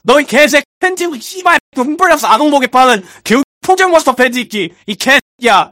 scout laugh short

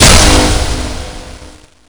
slash hit sound; chiabihamaru; cv project; invading executioner; zerosiki; slender

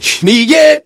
engineer laugh evil

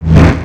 slash sound; chiabihamaru; cv project; invading executioner; zerosiki; slender

